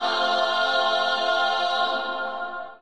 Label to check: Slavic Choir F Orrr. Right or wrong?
Right